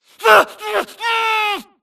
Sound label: grunt Struggle; Titanfall 2